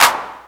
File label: clap sample a sharp key, TR 909 Clap